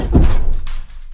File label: hit cyclers; Blind Drive